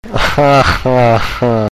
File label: ha ha ha